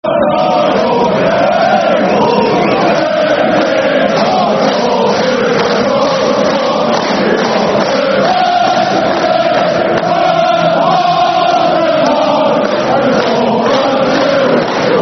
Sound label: No Surrender, Football Chants; Rangers GRFC